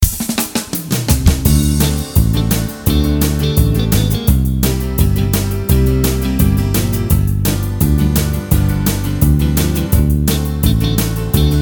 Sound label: country rocks; ajamsonic